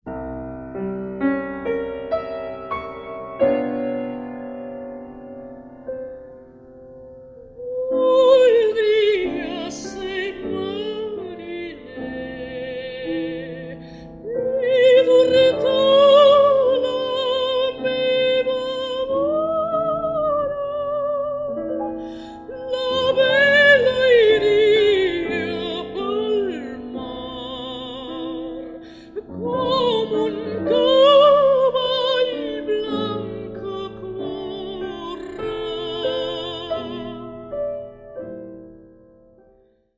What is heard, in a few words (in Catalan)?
Veu Piano Canco amorosa